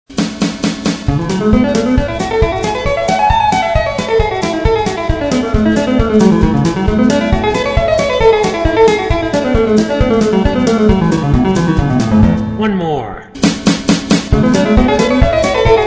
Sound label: scale